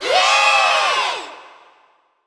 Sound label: Cheers Iei Girls